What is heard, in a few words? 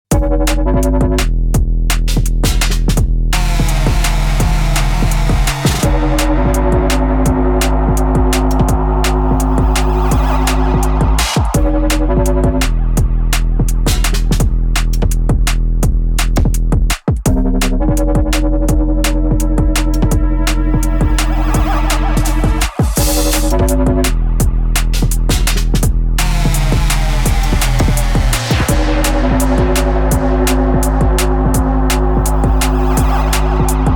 Basic Rhythm Remix